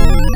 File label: Basic Bells; Synthetic's Serum Collection; Echo Sound Works Sphere